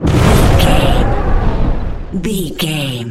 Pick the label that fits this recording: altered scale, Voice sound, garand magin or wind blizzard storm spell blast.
wind blizzard storm spell blast